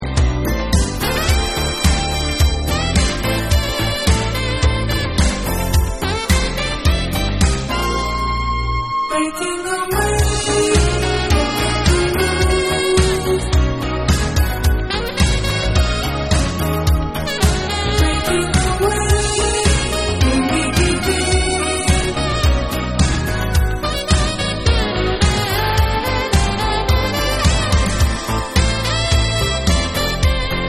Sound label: Sax Version